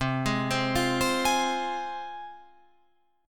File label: C Suspended 4th Sharp 5th, C, down, Guitar, Open F; Csus4#5 arpeggiated